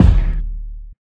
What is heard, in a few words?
long room reverb kick drum single hit d key